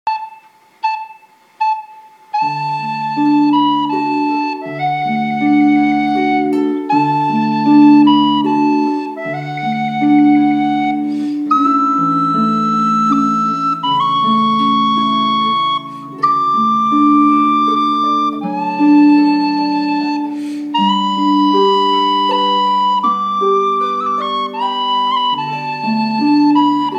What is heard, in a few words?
Silent harp